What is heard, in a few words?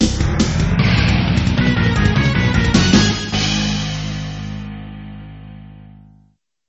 Round Fanfare; Biker Mice from Mars